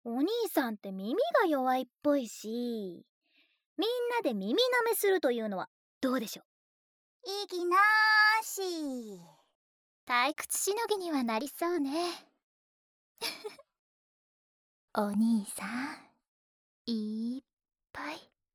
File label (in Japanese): 耳なめ; ギャルのご褒美; フェラ